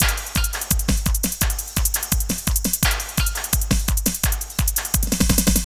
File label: loops 170 bpm; REACTIVATE; Masterbits - Tekkno Trax